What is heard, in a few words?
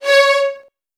C#2, VN 16TH, Virtuoso Strings; ILIO; Kirk Hunter